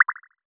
DRIP; KRONDOR

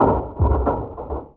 trap

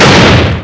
M GUN; JOSEPHIN

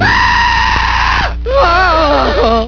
AAARGHA

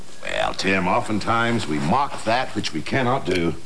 MOCK

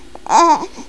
BEBE

